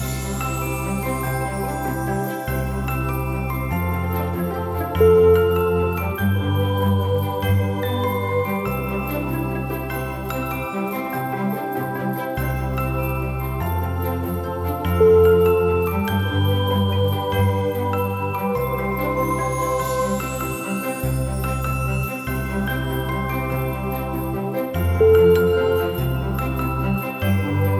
new jingle bells

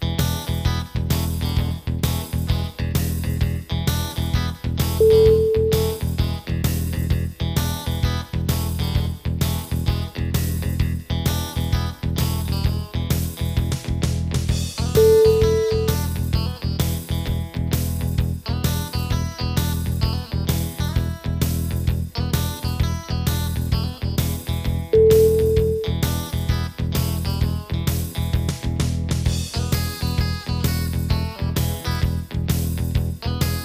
rockabilly

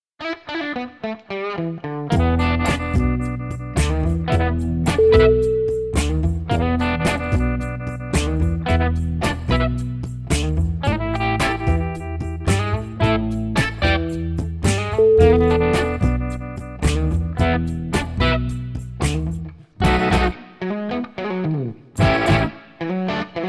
beatbox blues guitar

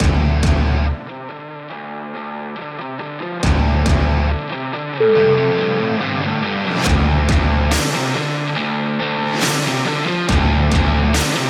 promo rock

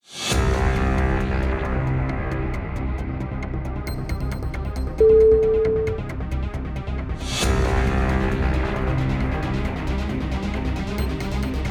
epic intense trailer intro

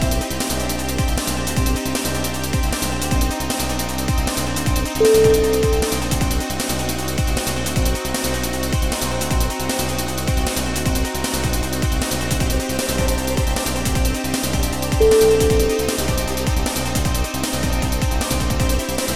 tablet trance

